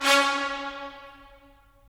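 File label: brass trumpets staccato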